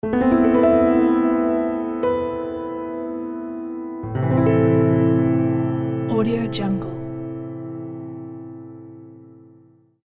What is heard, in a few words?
mysterious story in japan piano logo